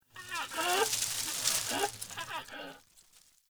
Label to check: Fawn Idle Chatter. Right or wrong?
Right